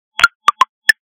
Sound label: lg w30 pro notification ringtone